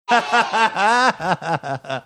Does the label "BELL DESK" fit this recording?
No